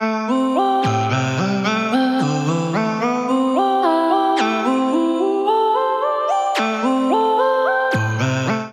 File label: Digital Voice Machine C Lydian 110 bpm; MIDI Clips; Chromatic; Ableton; Factory Packs; Voice Box; Transformed